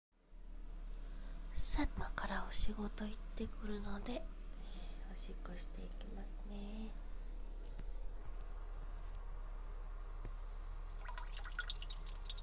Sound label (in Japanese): お家のトイレでオシッコ; ６日目・出金前の